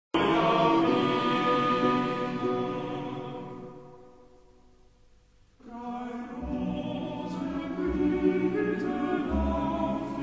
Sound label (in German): Chor Greensleeves